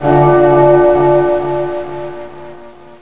Dark Castle Bell; Bell chiming in the Dark Castle; Rain

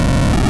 Rumble

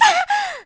Tetra Shocked; Tetra shocked and worried after falling; Zelda

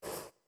Bubble Spit; Spitting air after the bubble pops in Deku Link's mouth (or when out of magic)

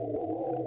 Hover Loop; Skull Kid; Zelda; MM

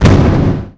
Jump; Ganondorf jumping (noises)

